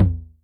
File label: Electric Tom, Yamaha DTXpress